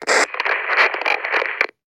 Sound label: Noise; Yu-Gi-Oh! Master Duel